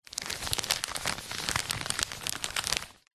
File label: wrapping paper handling movement; zapsplat; foley